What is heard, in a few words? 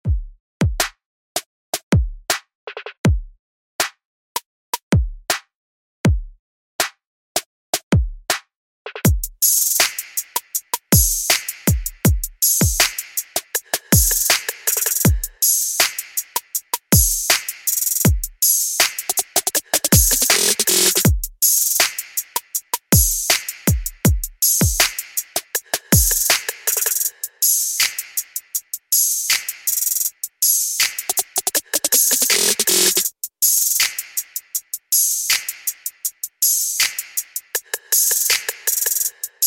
Hip-hop